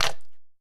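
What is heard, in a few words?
Soap Squirt Out Bottle, splashes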